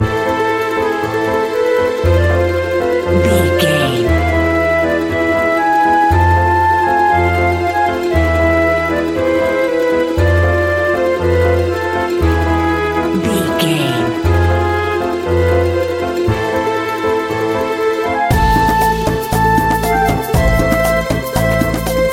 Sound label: An exotic and colorful piece of World music. Groovy, powerful, exotic and wild. Non western music; Mumbai in Summer